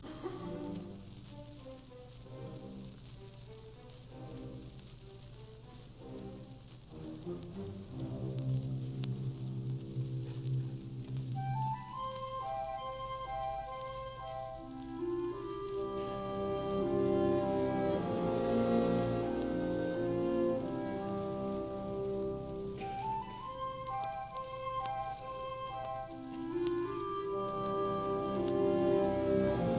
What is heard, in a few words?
Symphony in G major, Adagio, Symphony Orchestra; Dvorak; Philharmonic Symphony Orchestra